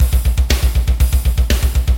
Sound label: metal